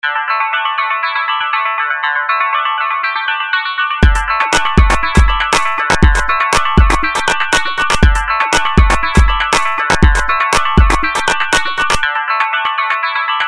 Electronic Guitars Grooves

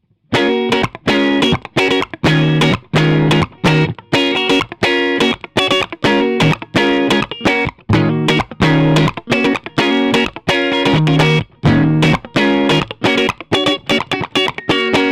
Telecaster Fender Dual Showman; Apogee Duet; Soft Limit; Sennheiser e606

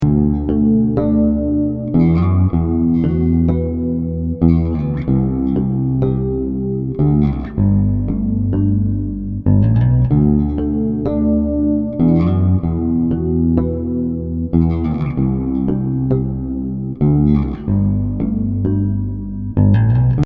Harmonics

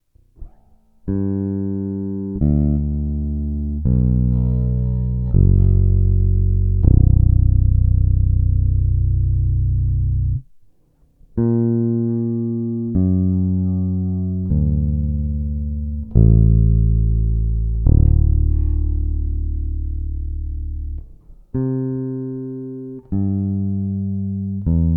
All five strings

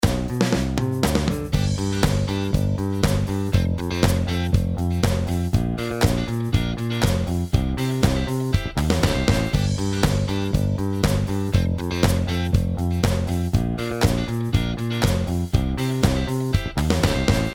70s Disco

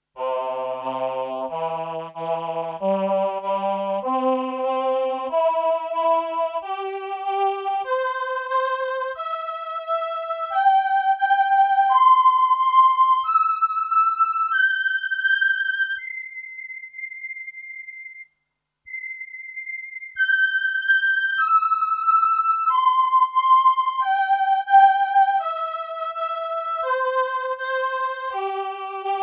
VP330 CS340 Male4 Female4 Ensemble

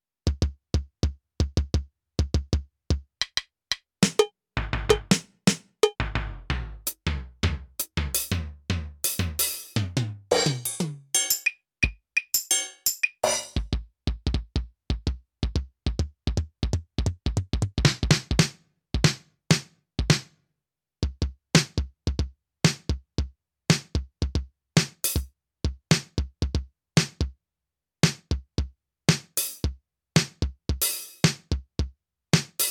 Drums

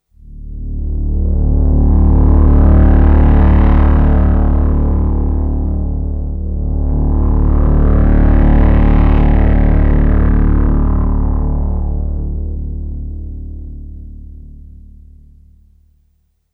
matrixbrute2 drone

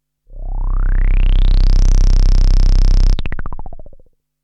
Korg MS20 Filter Sweep

